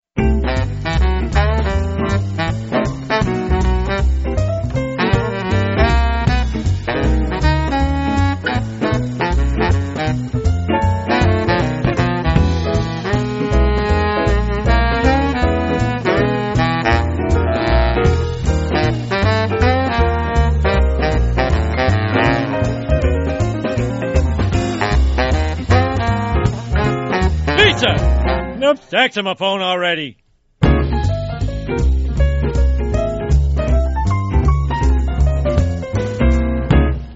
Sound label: end credits theme jazz quartet version; the simpsons